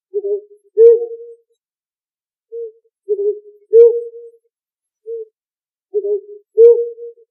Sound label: flamm, owl